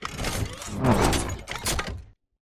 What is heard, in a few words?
melee low sweep forward; Titanfall 2